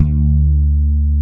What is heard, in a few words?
D-3, FRANGE BASS; AKAI S-Series CD-ROM Sound Library VOL-7